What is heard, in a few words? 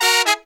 Horns 110BPM; Zero-G; Phantom Horns